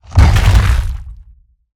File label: Roll Surface End; Evolve; Behemoth